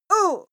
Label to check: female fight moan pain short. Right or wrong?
Right